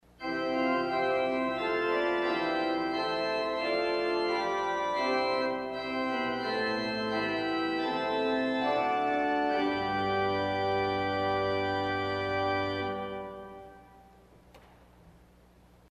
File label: Plein Jeu, organ stops; Culver Academy